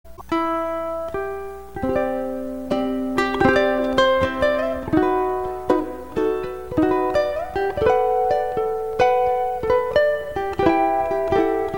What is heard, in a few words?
ukulele